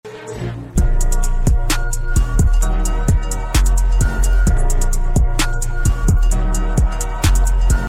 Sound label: beat